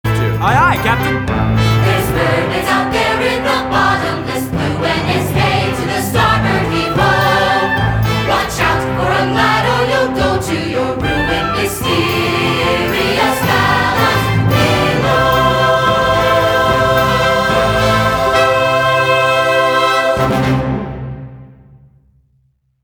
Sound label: Fathoms with words